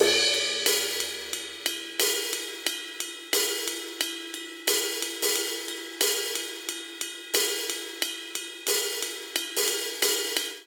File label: Swing Hip Hop Cymbals Only 90 bpm; 4 4; MIDI Clips; Ableton; Factory Packs; Drum Booth